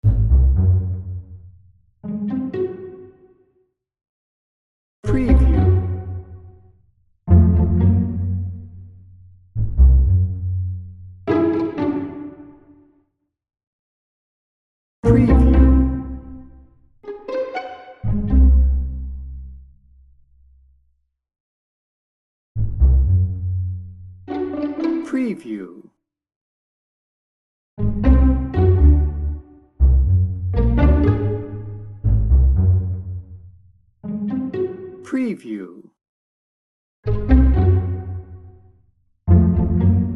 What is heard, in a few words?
quirky pizzicato strings only